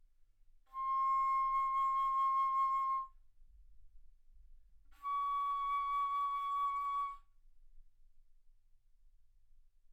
Bass Flute C6 Db6; ff

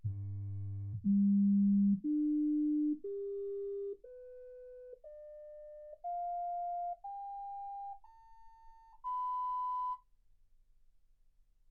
sinus tones 48kHz

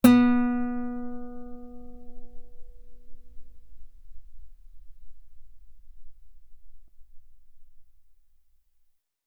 Guitar ff sul B B3

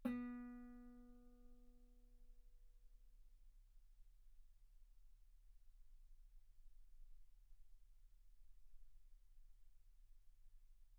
Guitar ff B3

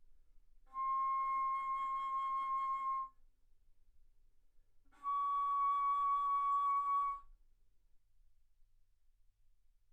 Bass Flute C6 Db6; ff

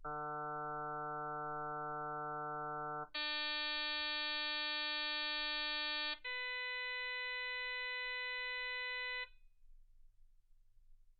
harm sinus 48kHz

